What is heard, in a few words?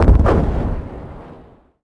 shield; NanoFXv2; ExplosionFX; ExpShield